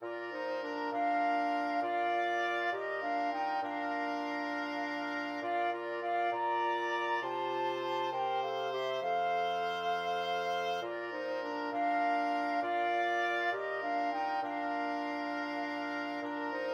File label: flexible mixed ensemble, 4 players